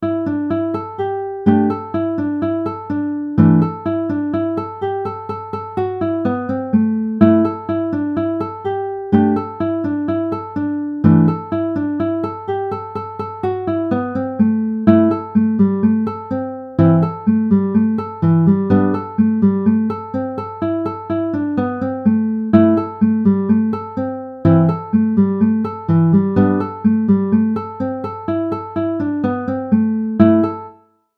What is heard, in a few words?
banjo